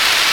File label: Applause; SNES; Secret of Evermore